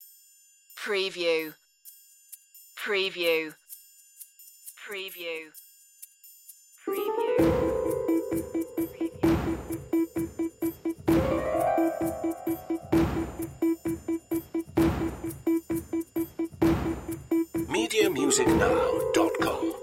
no melody

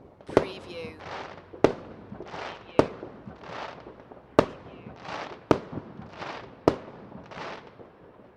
Fireworks Bangs and fizzes; beanstalk audio

